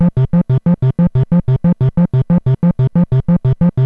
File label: synth